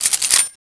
g11 clip pull, weapons

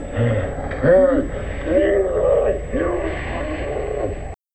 grraaa

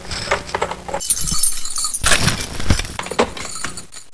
mg reload

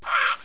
trooper pain

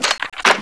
m4 nuke mortar reloading

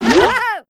long jump; pacman sfxt

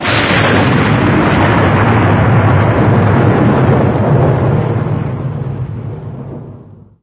thunder

